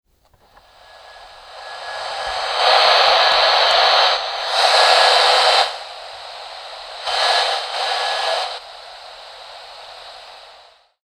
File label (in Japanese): 通信不良